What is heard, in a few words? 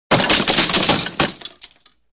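Bullet Impacts Multiple, wood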